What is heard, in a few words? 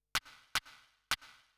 CLAP HOUSE 125; eJay